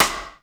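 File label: GATE percussion stick; Crypton - DrumBase5000; GATE KITS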